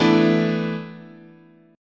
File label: E 7th Suspended 4th, chord